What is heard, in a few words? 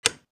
lock; CC0 RPG SFX